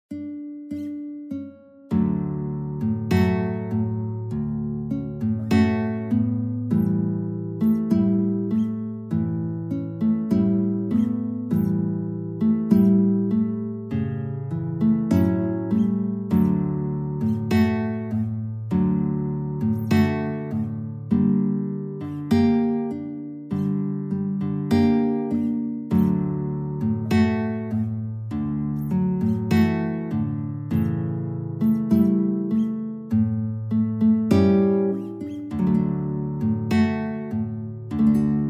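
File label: 2 guitars, guitar duet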